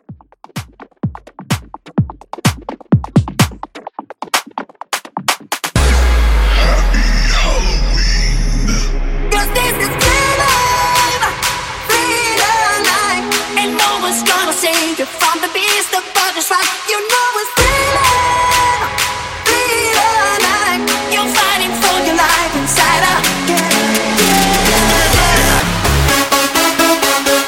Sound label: Halloween Bootleg; Remixfreakz